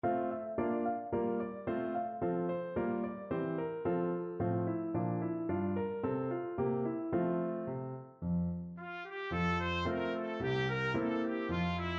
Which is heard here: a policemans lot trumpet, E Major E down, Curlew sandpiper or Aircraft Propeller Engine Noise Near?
a policemans lot trumpet